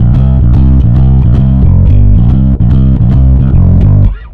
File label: Bass, Distorted C; Bass X